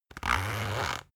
zipper run; objekte; reissverschluss

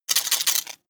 sci-fi electromechanics tiny voltage spark

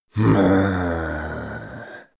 male grumble bored; menschen; koerpergeraeusche; soundpool

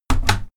closing door

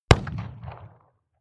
revolver shot 44cal remington 100m; schusswaffen

